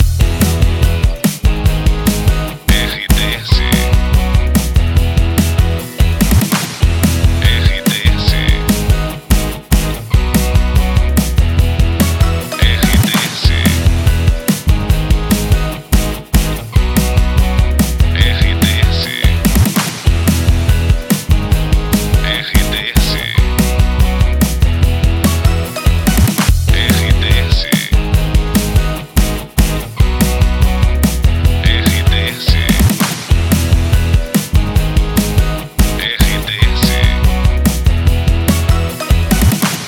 trilha para locução; Cidade FM

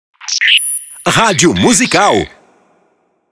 ponte musical

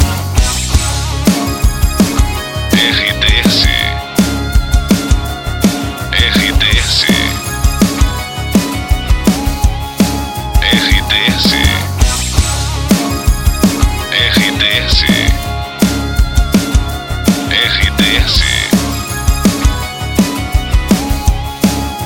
trilha para locução; Pacote Praia FM, Bertioga/São Paulo. Estação com características popular

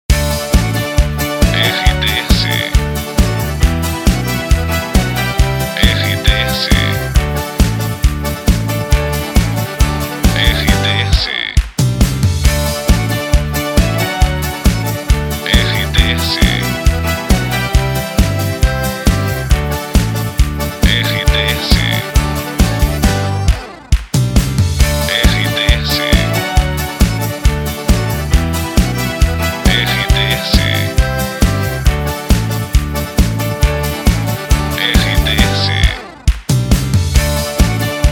TRILHA PARA LOCUCAO FESTA SERTANEJA

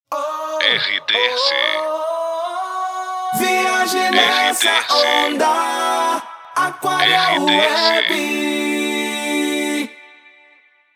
ACAPELA; Aquaria Web

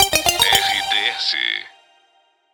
ID NEWS

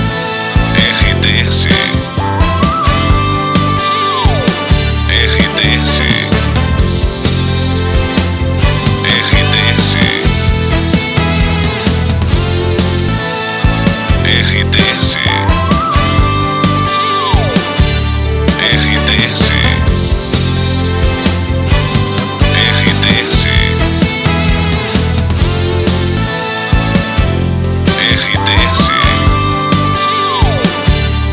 trilha para locução; Oeste

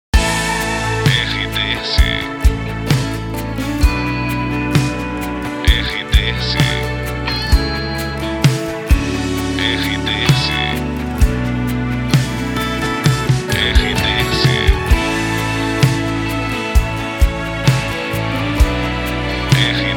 trilha para locução; Pacote RBC, São Paulo/SP emissora gospel com características populares

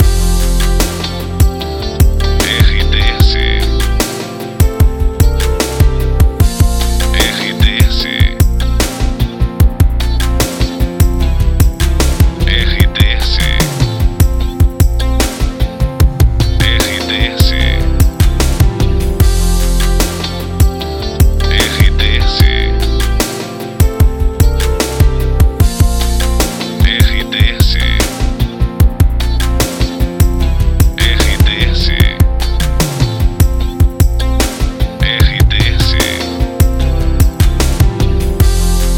trilha para locução saudade; Itapoan FM 2020

